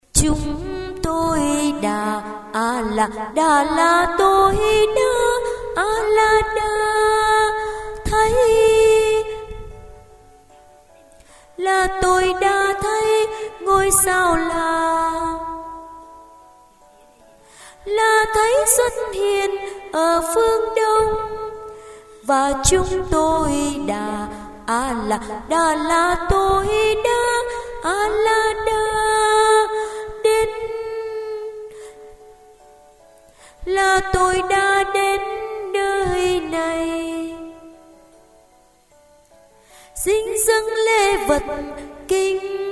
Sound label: tenor